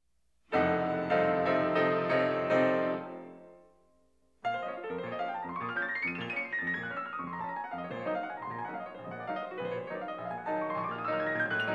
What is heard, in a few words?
Piano; Kabalevsky Prelude; The Mindru Katz Collection